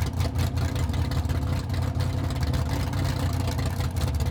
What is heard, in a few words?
vehicle musclecar idle; teardown; ps5 windows xbox series xs